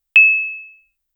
generate a quick sound ef, blip

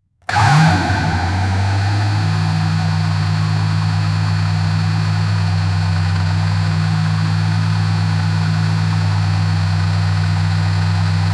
a rb26 dett a garrett, exhaust

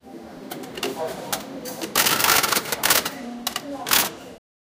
cash register sound

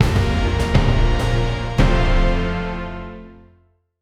short epic mission comple, triumphant

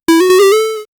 player die retro

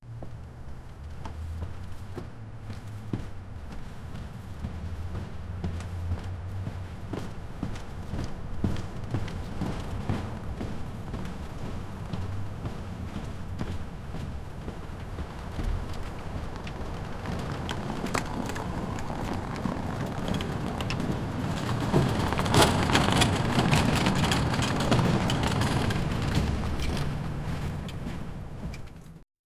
ambient sound of outdoors

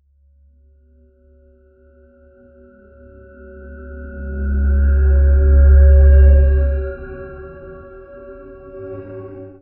subtle low frequency hum; building intensity

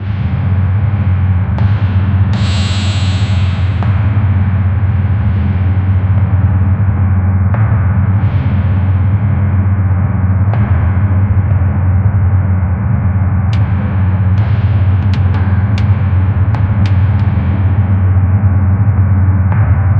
a balanced rhytmic mind; brainwashing

